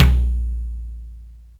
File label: roomy tom drum one shot a sharp key